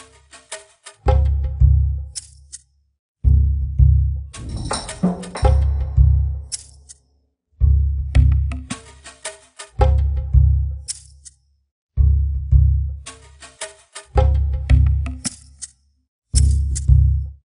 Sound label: Jungle Level